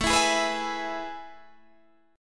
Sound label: A Major 7th Suspended 2nd, Guitar, Standard; AM7sus2 strummed; JGuitar